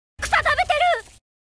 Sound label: eating; kusa